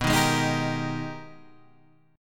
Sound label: Ab Minor Major 9th